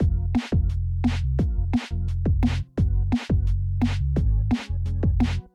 Win Loop; Cyber Hook; Win Loop Neuro

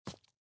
SFX run rock; out of reach treasure royale 2020 gamerip pc

